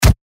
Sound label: Fish Body Hit